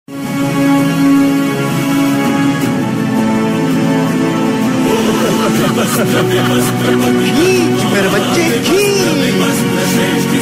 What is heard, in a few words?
Promo song; Mahabharat; Draupadis Cheerharan